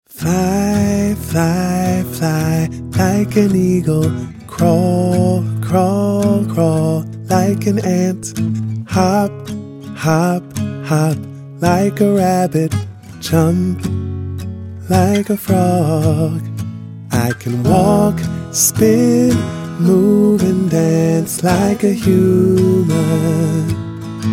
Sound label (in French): chanson anglais; Songpoeten